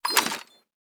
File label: breach throw; Counter Strike Model Pack; CS:GO; survival